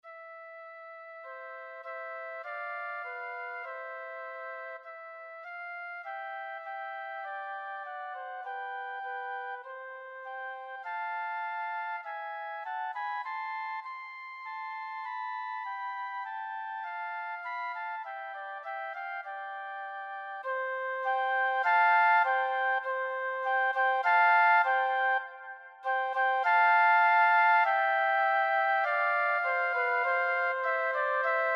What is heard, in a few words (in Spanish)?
benedictus 2 flautas